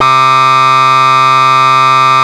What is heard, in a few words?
Anasound Long mid C, Analog Single Notes; Sonic Foundry (Sony Creative Software)